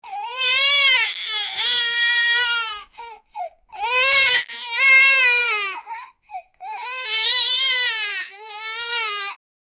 baby crying, unhappy

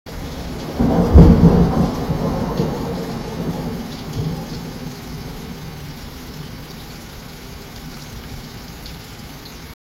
the sound of rain in, urban

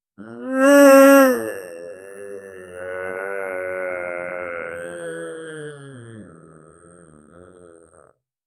zombie moan

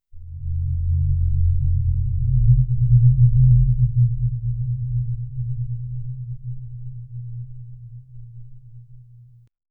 glowing sun crackle magic, woosh

